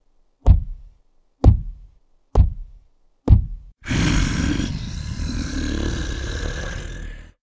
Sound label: stomping approach; blindside